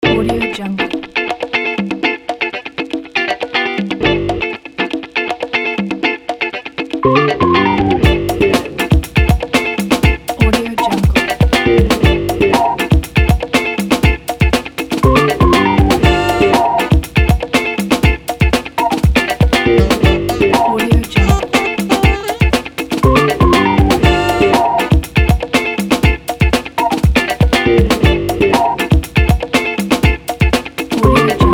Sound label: make it funky